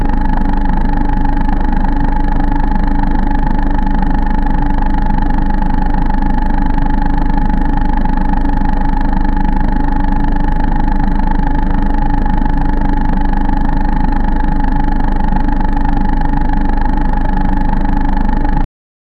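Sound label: a small electric spaceship, drones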